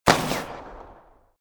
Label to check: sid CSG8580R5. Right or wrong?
Wrong